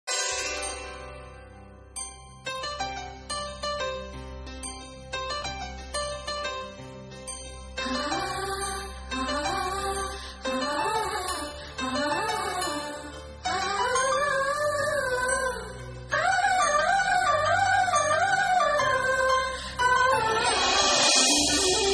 melody bgm lovely melody bgm ringtone south indian bgm; anand movie